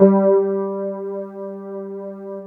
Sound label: WET RD G2 R, Keyboards Old School; USB Soundscan vol.09; AKAI; WET ROAD